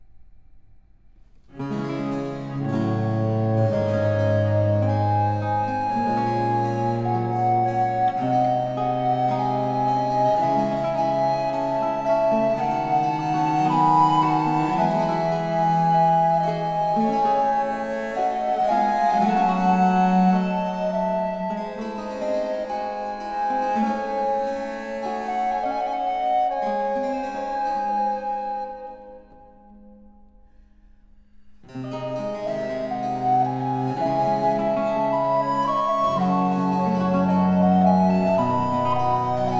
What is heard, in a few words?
Sonata Terza in D Minor for two Recorders Adagio